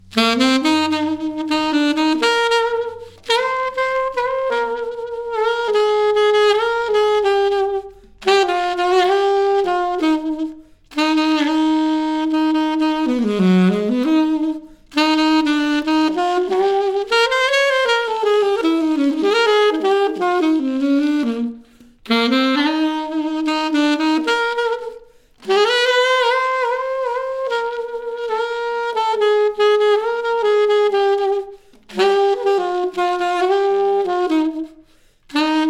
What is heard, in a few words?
SoloSax